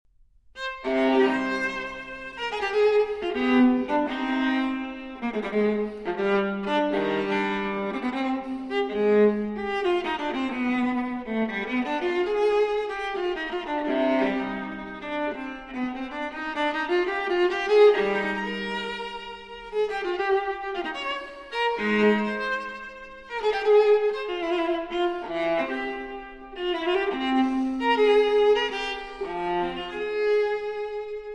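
Cello Suite No. 5 in C Minor Transcription For Viola Allemande; J.S. Bach; BWV 1011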